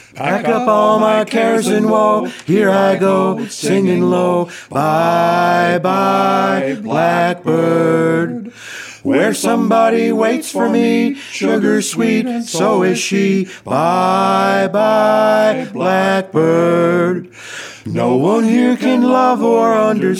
Vocal Take One Amp Comp

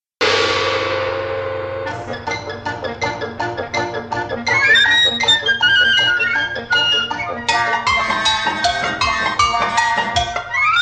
gong

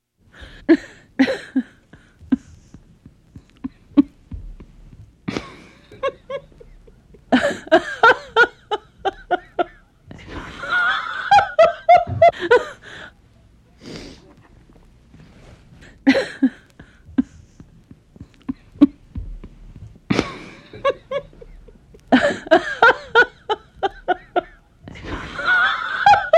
woman gentle, laugh

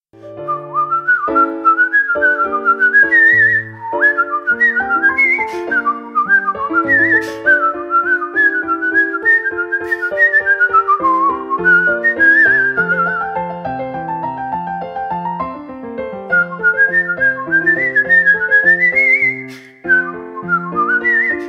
whistlening classic

